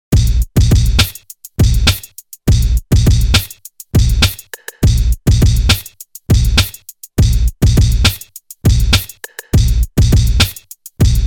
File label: remix bpm 107